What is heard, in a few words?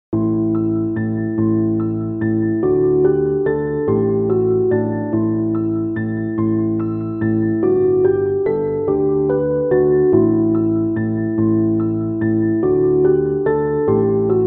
blues